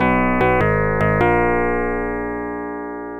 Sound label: Unknown Jingle